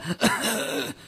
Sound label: killed; monsters; stalker; death